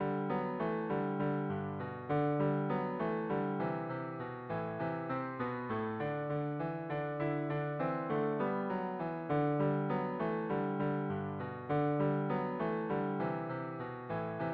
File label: jingle bells, viola cello duet